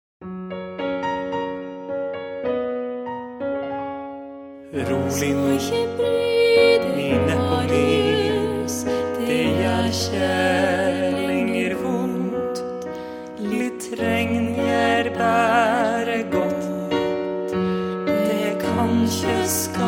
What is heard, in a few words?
piano